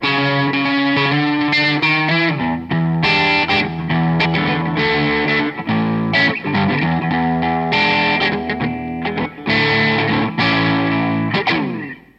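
more dist neck bridge parallel bass and treble cut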